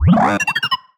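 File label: Sci-Fi Droid Robot Emote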